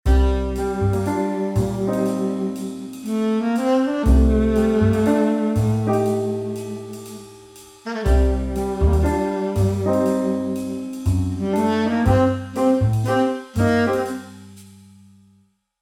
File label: Mystery situation light suspense scene sitcom